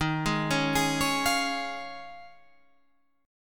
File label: Eb 9th Suspended 4th, Guitar, Half Step Down; Eb9sus4